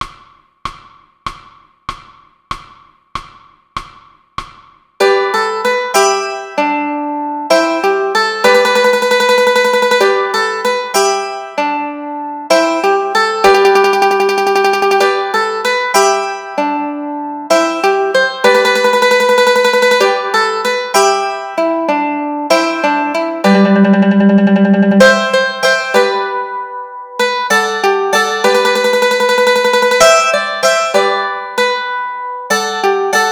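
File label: Mandolin Melody Chord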